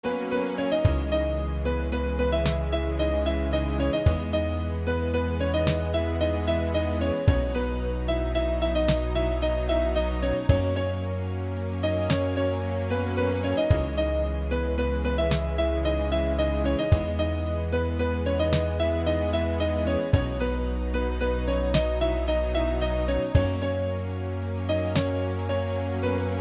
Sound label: Piano